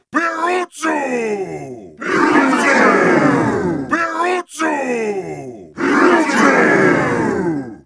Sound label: ringtone